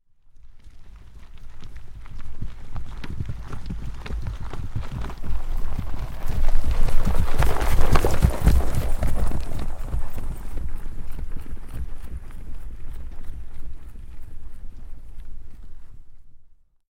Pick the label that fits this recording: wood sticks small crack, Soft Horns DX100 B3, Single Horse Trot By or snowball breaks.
Single Horse Trot By